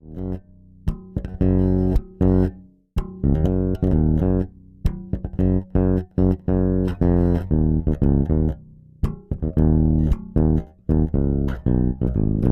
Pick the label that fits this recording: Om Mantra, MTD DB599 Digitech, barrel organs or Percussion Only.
MTD DB599 Digitech